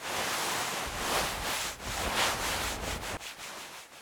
rub wool

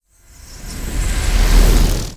sword

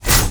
ddmetalclaw

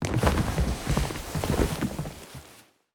people stand up

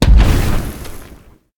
imp heavy gun

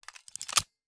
gun load

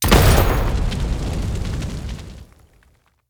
launcher

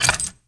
p atk magclose

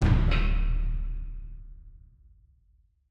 drum off

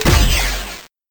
cannon

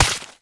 plant throw

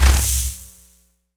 add shield

